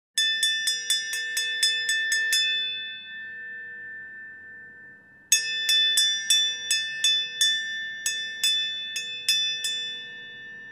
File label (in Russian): udary v ryndu